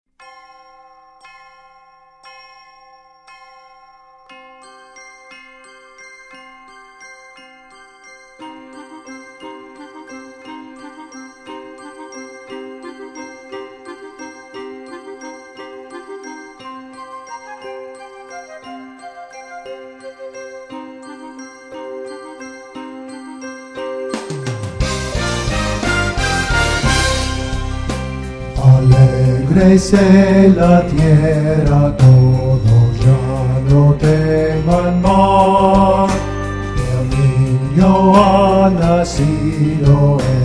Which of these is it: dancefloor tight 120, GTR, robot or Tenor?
Tenor